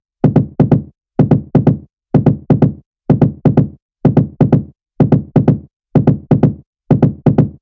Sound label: Future Rave Kit 126bpm Toms; Drums; EDM Drums; Drum Kits; Stems; Ghosthack; Ultimate EDM Drums; Drum Mega Bundle; Future Rave Kit 07